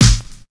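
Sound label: Big Beat Drumloops, snare drum, right; USB Soundscan vol.25; AKAI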